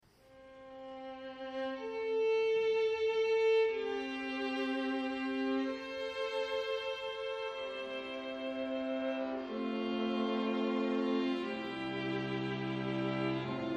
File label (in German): Streichquartett a moll; Beethoven; Op.132